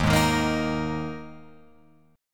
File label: Eb Suspended 4th, Guitar, Open F; Ebsus4 strummed; 3,3,3,5,6,5; JGuitar